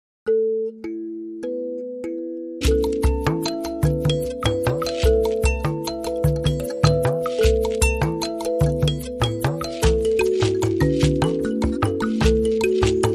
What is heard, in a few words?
Mbira Speaks